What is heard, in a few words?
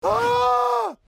scream; lebrons